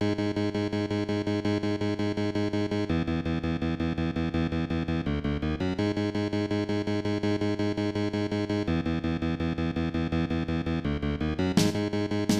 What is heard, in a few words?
No SFX; F-Zero; Death Wind